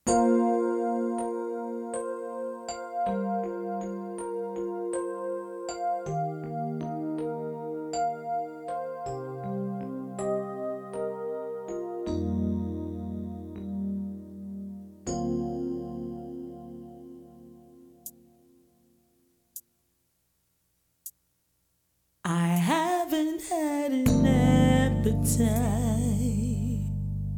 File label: i need you lord vocal